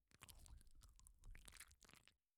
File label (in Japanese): なつみによる気持ちいい耳舐めと手コキ（右耳）, ASMR